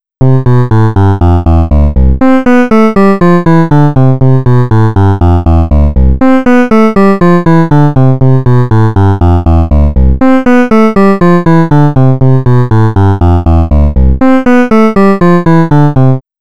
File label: programmable wavetable